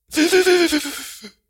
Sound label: pony sniffling